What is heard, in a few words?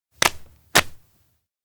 clap multi; anemoi